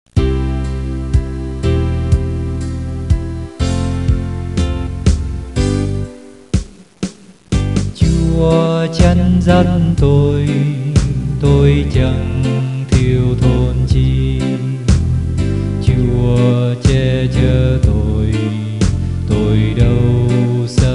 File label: ALTO